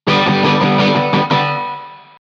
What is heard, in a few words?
brushing miss